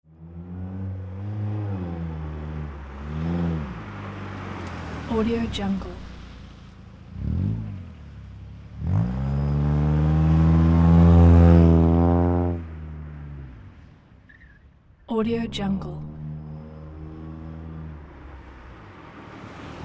street race car drives in figure eight with tire squeals